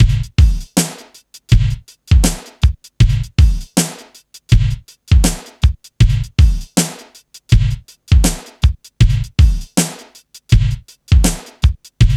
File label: HIPHOP; INIS; Funky Xtreams